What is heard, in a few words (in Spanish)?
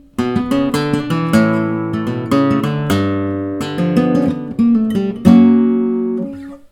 falseta